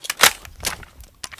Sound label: bren mag in; weapons; mkbren